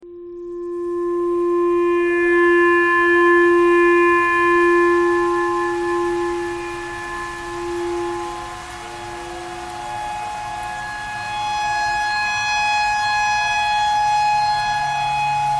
tornado siren clarinet thread pentatonic

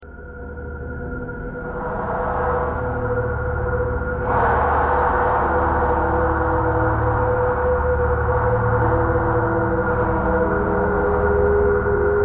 traffic core birds thread simple

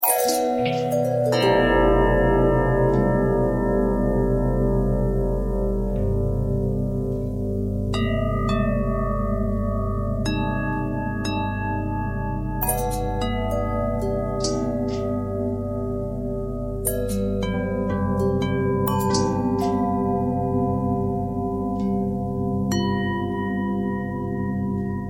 bell long bell harmonic twist

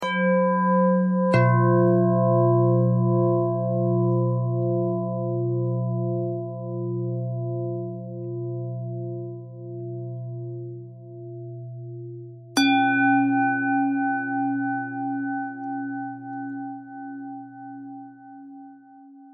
bell bell thread simple, bells

